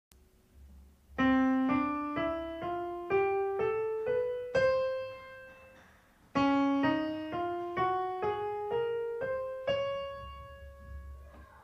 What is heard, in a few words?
C and C Sharp Scales